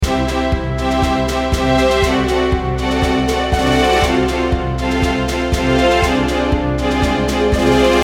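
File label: Short RPG, BGM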